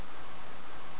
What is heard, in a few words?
Loop, Train; OpenBVE